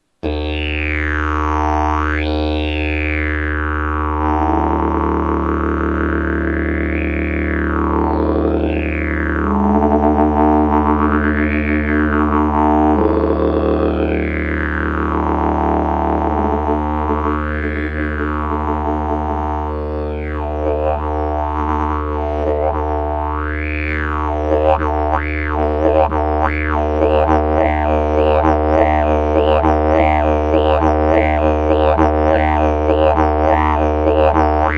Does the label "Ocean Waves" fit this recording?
No